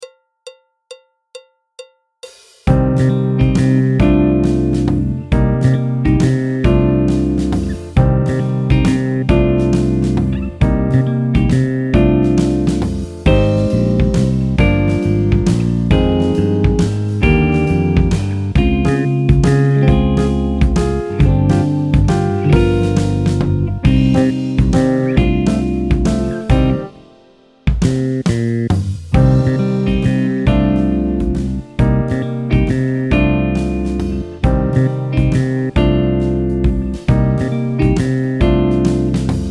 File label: clarinet, flute, tenor, trumpet; Jazz Syllabus Level 4